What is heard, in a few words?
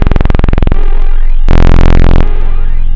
vgame basic; Adventure Kid